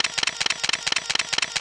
Shutter continue; LG; BL20-New-Chocolate

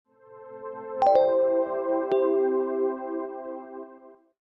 Power Off, phonetones system

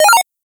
Mess bleep; Sony Ericsson; F305; PlusFourSix; Ying; Paul Bergman

